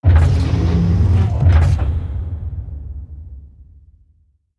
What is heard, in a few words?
stalk train door open; Half Life 2; Episode 1; citadel